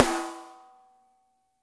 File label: dry, snares; Spectrasonics - Burning Grooves CD2